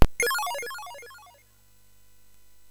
Fuzzball, MOS6581R3

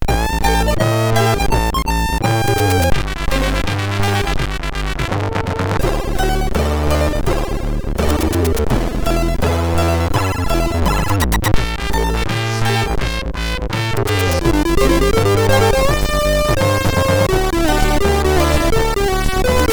Guitar Work, MOS6581R4; sid

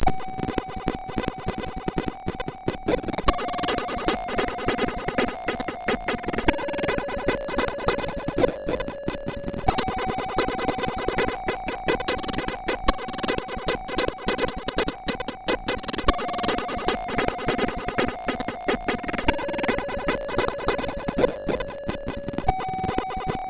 sid, MOS6581R4

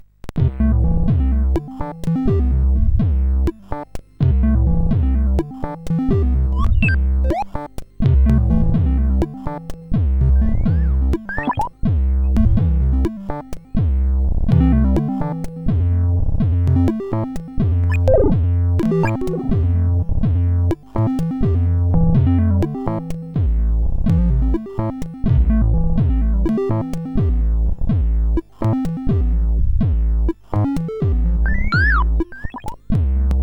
note sid CSG8580R5; Birds on Fire

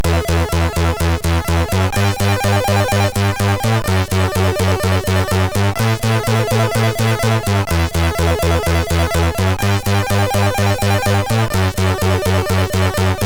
sid MOS6581R3, GAMES; Crystal Cave

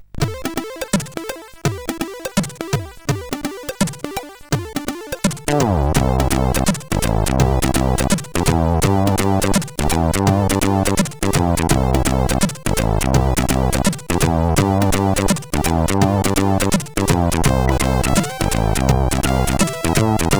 Jazzy, sid CSG8580R5

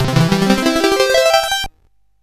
Fire Breath, CSG8580R5

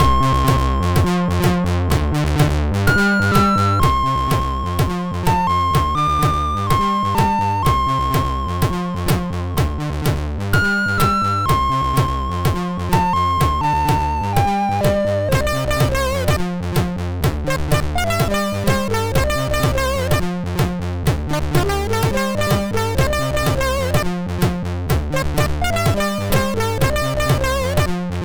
Happy, MOS6581R2

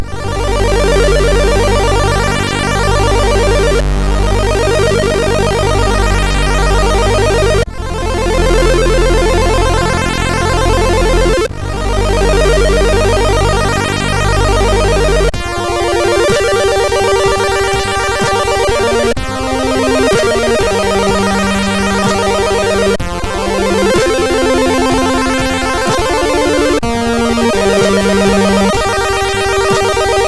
sid, MOS6581R2

